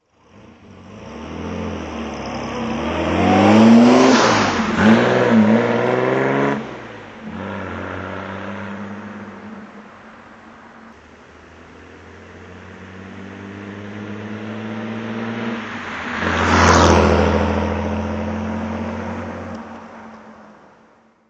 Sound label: austin healey 3000 mk3 ph1 drive by